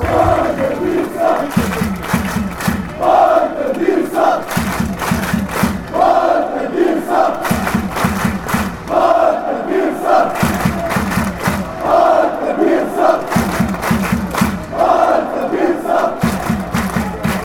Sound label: slovenia chant